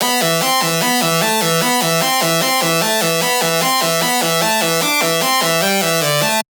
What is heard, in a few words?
Lead Loop E Screech, Dubstep, 150bpm; Ghosthack; Dubstep Sound Arsenal